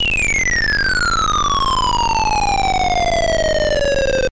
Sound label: fall; loderunner; APPLE2